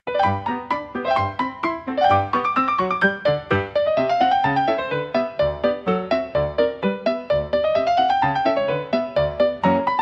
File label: Joyful Keys Polka